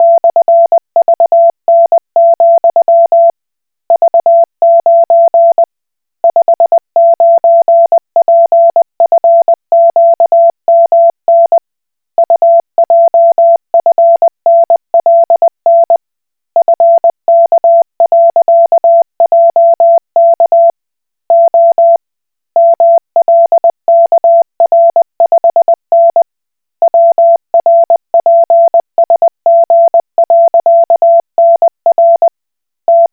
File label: Koch Morse